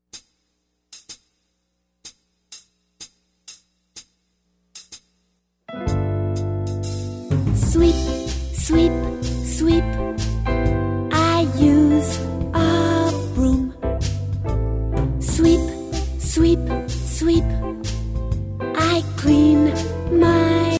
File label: sweep